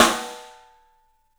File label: STEEL S, drums; 3D Sound